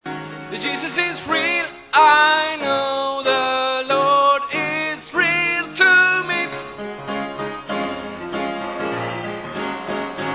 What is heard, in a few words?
tenor